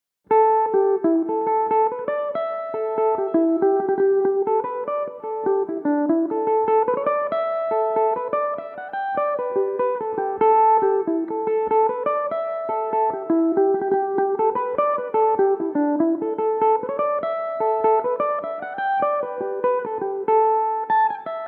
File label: irish mandolin, tunes